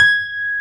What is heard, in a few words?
CP-80, Yamaha CP-80; Greytsounds VOL-1